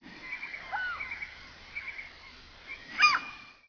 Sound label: gull